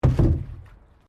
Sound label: PLASTIC BARRICADE IMPACT